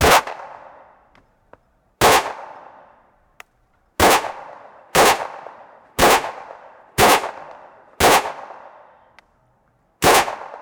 gun shots from glock

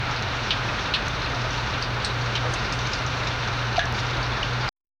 cold quiet industrial bac

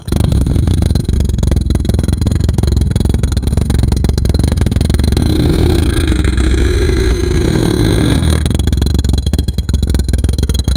fast running stone giant; fantasy

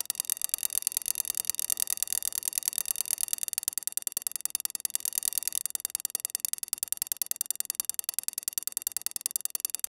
a sequence of crisp mecha

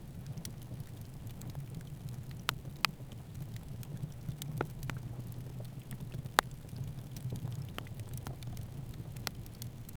cozy campfire next to a, creek

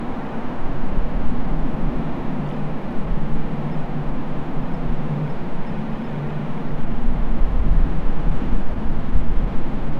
wind whoosh faint airship

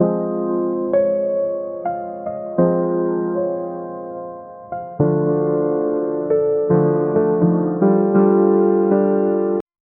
laid back funky lo fi wit, electric piano

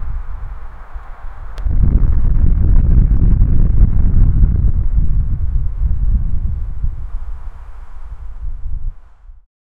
clouds passing by

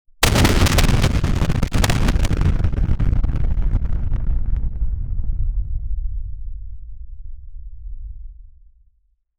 a massive sharp handclap; disasters

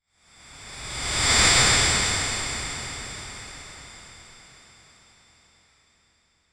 transitions

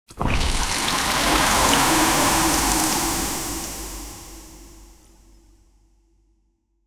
a deep sloshing of thick, liquid

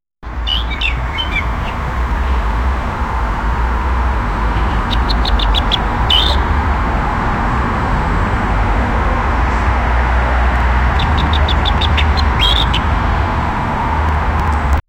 single bird chirping

